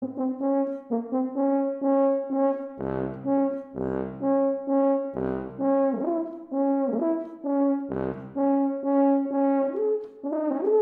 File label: TUBA FRAGMENT